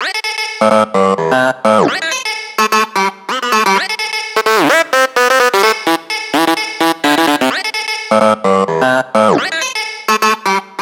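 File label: 128 BPM Drop Leads No SC, Synth, A minor; Vengeance; Essential Clubsounds Vol.5; Highway Kit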